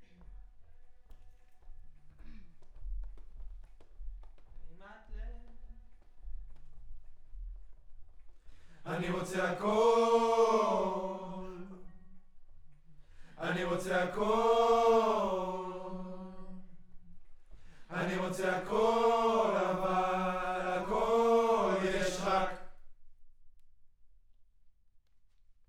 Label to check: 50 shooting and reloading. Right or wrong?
Wrong